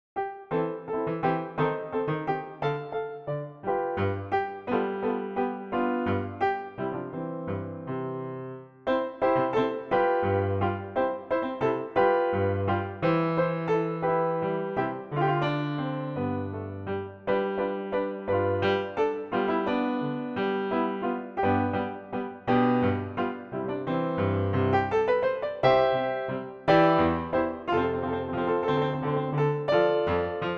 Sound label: solo; cielito lindo; pnoteq